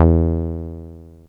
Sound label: SOFT E2; Best Service Alpha Dance vol.2; SOFT SEQ.1